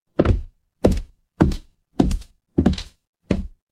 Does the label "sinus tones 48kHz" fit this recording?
No